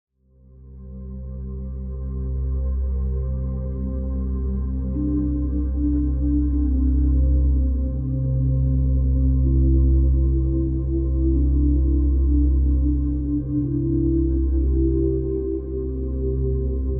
resonance hum sounds sfx; Ferngully

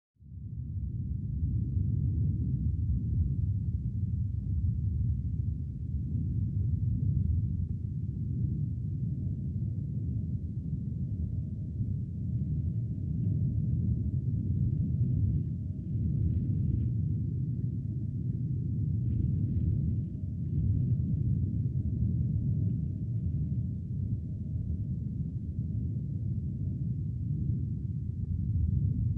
silence sound effect empty room

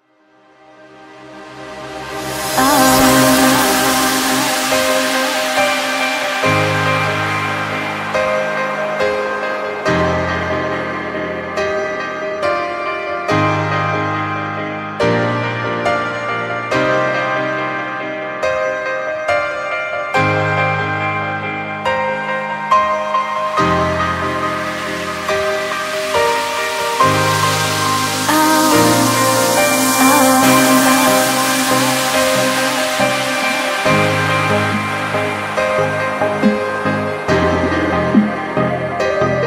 epic techno; for outdoor raves

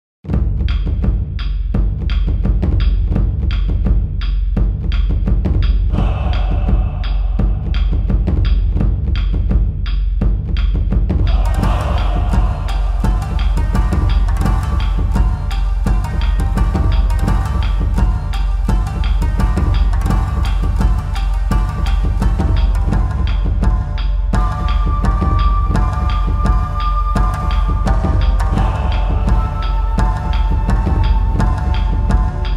ancient timeless music beat; Conan-esque music beat; for Ninja Princess The Unknown History of Petra

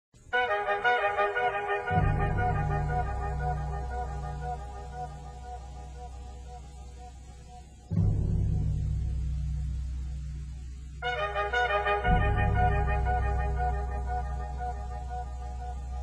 trumpet echo; Star Spangled Banner; Washington D.C.

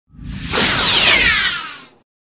Sound Effects laser; Star Trek

